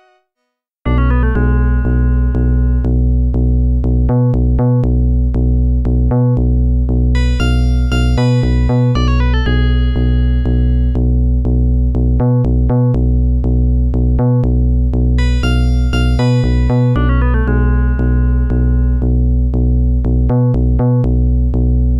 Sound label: Dungeon; u1-ponycanyon